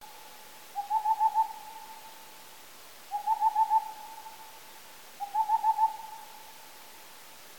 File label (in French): nyctale de tengmalm